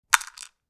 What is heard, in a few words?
Medicine bottle dropping one pill in